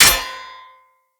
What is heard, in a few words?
Short Sword Medium Impact Metal